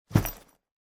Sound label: rucksack put down